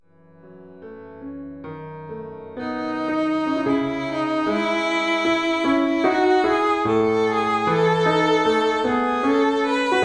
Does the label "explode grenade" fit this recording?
No